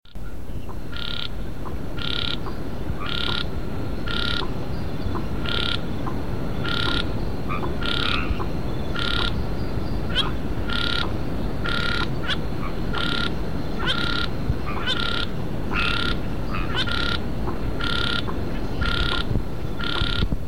Scinax alter; Picinguaba Ubatuba SP; A Silveira

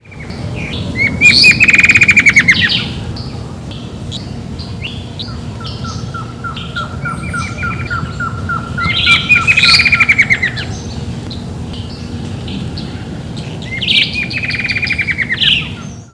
Herpsilochmus rufimarginatus; Cantareira S Park; Brazil

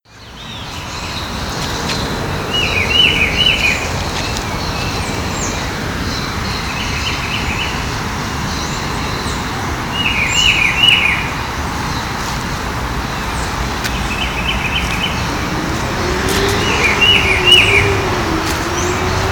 Cyclarhis gujanensis; Ibirapuera Park; SP; Brazil

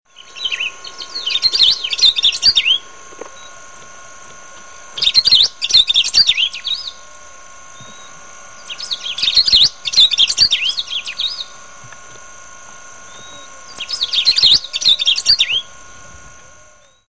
Saltatricula atricollis; Bonito; A Silveira

